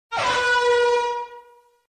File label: air horn; lethal company